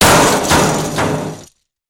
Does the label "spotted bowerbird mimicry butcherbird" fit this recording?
No